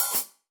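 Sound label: Sab Open Hat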